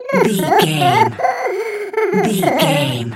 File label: goblin fairy cry whimper